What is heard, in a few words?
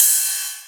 Ride, Rides; Electronisounds